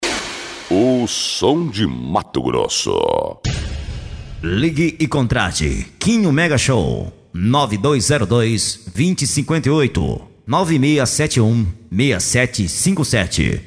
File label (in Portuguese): sertanejo vinheta